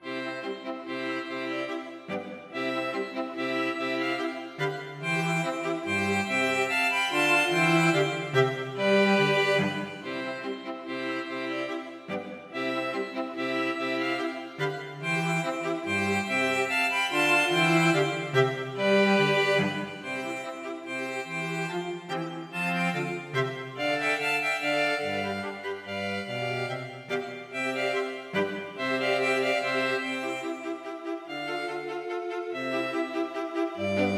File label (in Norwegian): Menuet for strykekvartett; Calmar